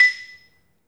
MARIMBA; 3D Sound; 3D Keys VOL-1

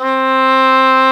English horn C3; InVision Interactive; Lightware VOL-1; Instruments & Percussions

